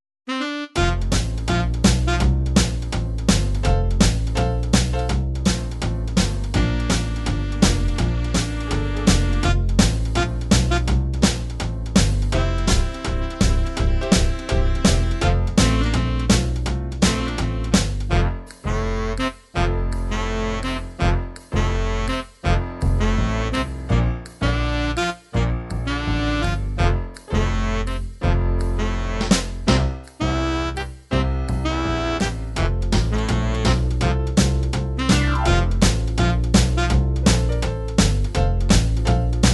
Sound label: jazz sock hop, midi